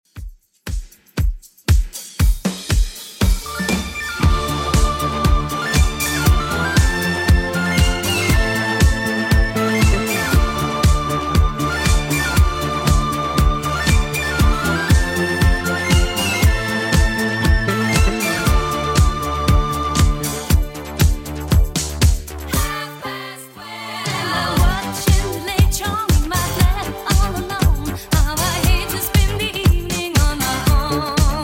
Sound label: 70s Redrum 118bpm